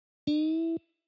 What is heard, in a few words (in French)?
effets de jeu Bend 1 ton